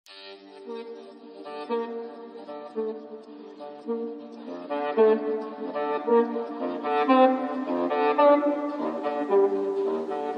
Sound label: sax